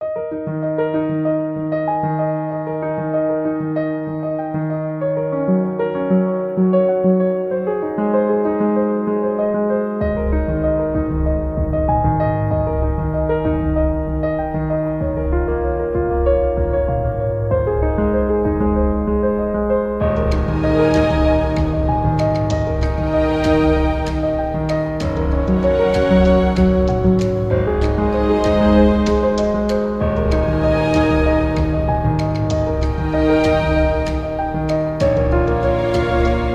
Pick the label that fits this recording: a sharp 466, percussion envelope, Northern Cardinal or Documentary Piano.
Documentary Piano